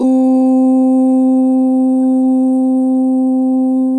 Long Ooh - 2 bar flat, Vocals

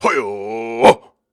Grunts and Chants, Viking Vocal

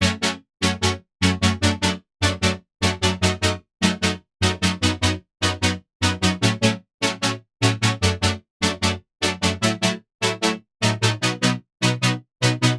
Synth Loops F# 150; Trap and Dubstep; Future

